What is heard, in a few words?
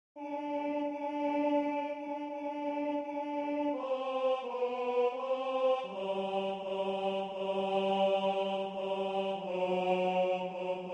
Tenor; Away in a Manger